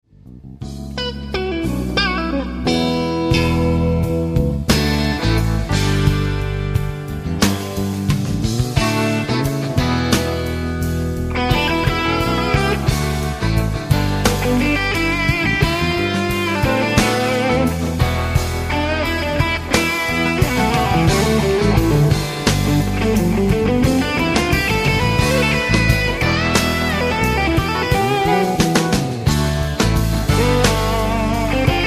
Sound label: solo